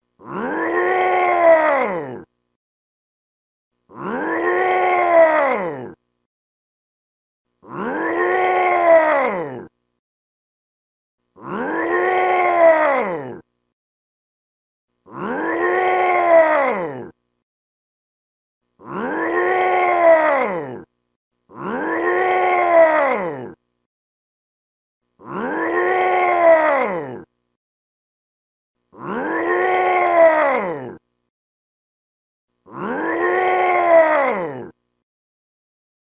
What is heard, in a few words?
straining